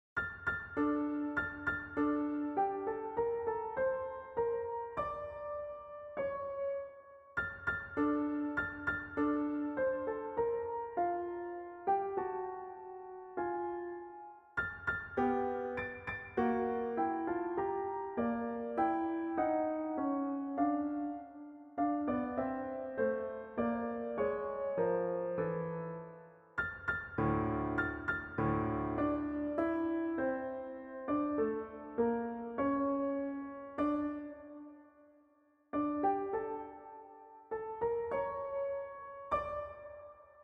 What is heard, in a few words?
keyboard; Window Birds